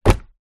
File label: household books small pile heavy down on surface